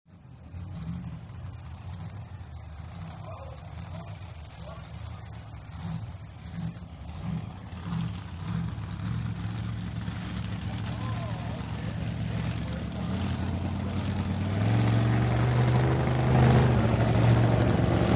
Monster Trucks; Racing Ambience, Pa, Crowds, Monster Trucks Idling, Revving, Waiting To Race Thru The Obstacle Course; Shot Cu From The Obstacle Course.